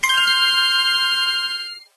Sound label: Coin; Thrill Drive; arcade